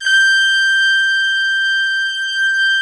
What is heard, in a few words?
BRASS G5, ORCH BRASS; Greytsounds VOL-1